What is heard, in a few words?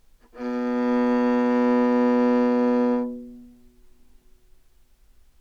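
baroque viola C string; OPENAIR; Anechoic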